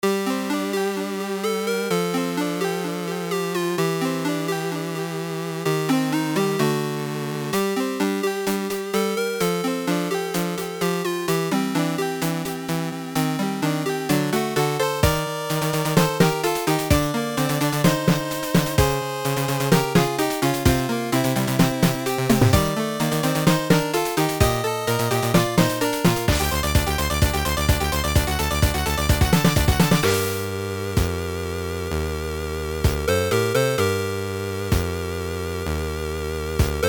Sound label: 8Bit